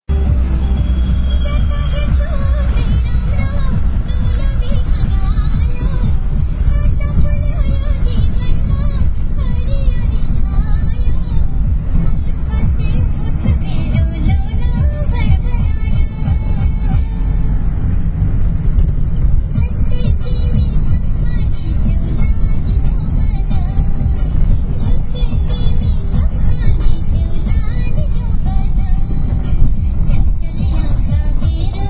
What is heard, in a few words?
Bollywood Bus